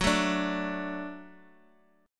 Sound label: F sharp Minor Sharp 5th, F sharp, Guitar; strummed; 4A,4E,5A,5D,5G#,6C#; x,x,x,4,1,1